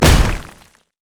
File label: missfortune basic attack